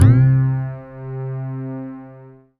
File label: SYN, Roland JD 800